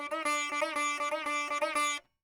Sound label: sitar